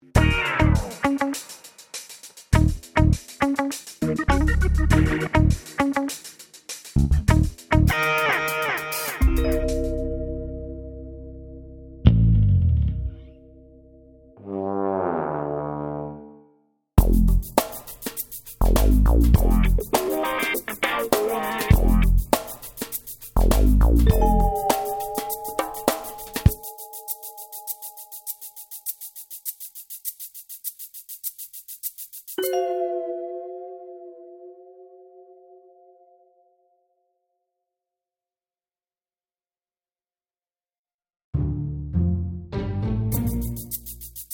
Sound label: Funky Cue